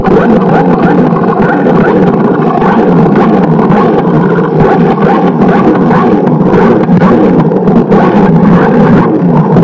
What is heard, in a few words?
boosting turbo engine burnout